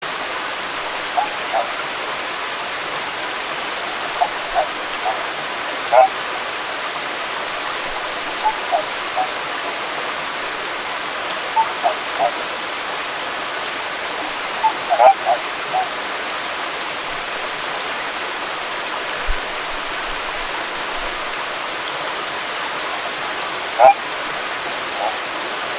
Phrynoidis asper

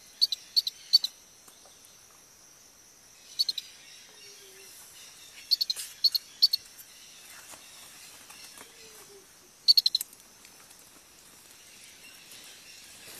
Allobates flaviventris

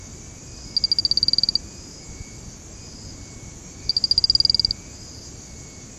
Stumpffia psologlossa

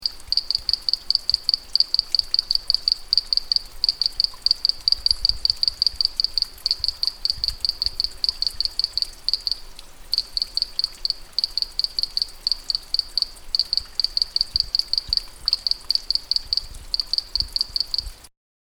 Leptobrachella tengchongensis

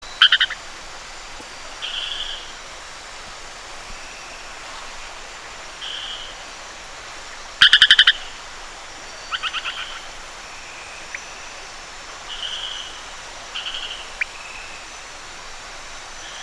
Pulchrana signata

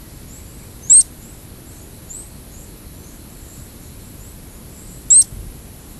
Stumpffia obscoena

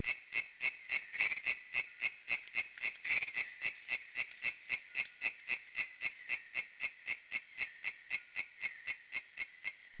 Philautus kerangae

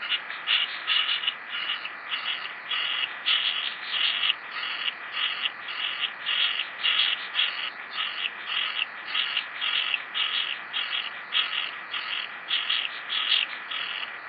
Ranitomeya summersi

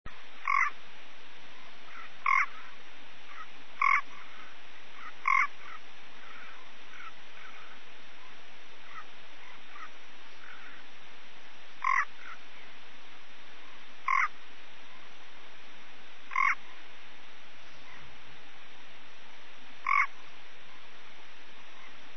Physalaemus nanus

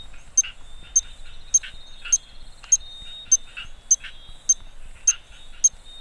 Stumpffia achillei

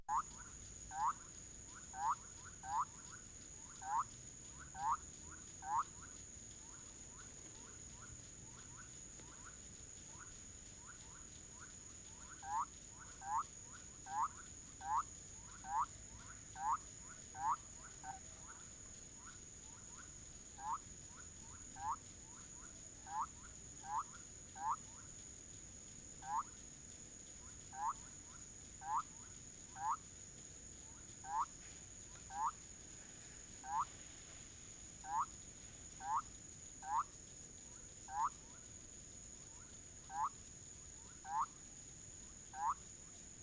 Leptodactylus bolivianus

